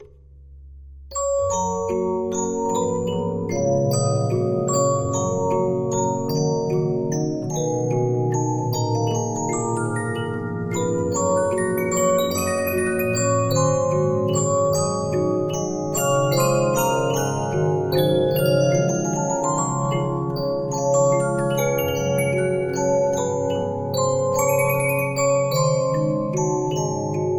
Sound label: Regina, 15inch disc musicbox